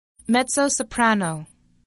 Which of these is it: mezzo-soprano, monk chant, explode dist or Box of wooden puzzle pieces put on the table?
mezzo-soprano